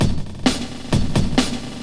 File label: drum loops; bb drum loops